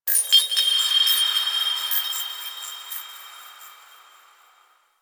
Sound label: Moon Chimes Small, Ambience; Warframe; Orokin